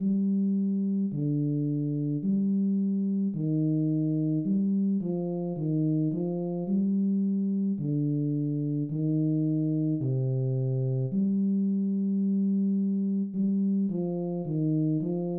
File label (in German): bass